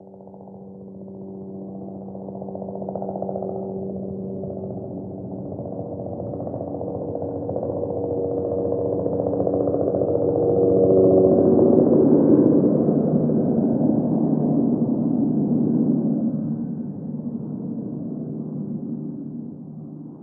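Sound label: heli flyover interior; Call of Duty Modern Warfare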